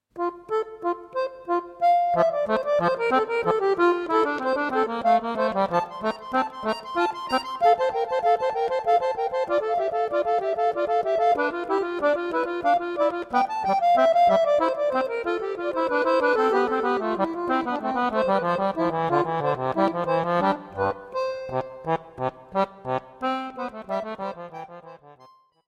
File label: accordion, invention in f major; invention in f major nr.8